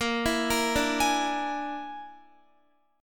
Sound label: Bb 7th Flat 5th Bb down Guitar; Bb7b5; arpeggiated; JGuitar